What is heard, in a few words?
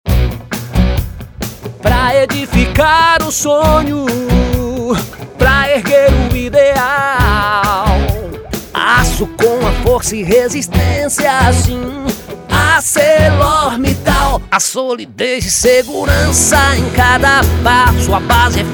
Jingle; Acelor Mittal